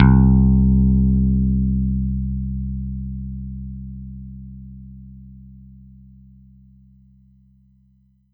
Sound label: Roland JV-2080 Pick Bass C2